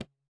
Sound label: THROAT; Spectrasonics; Metamorphosis; THROATERS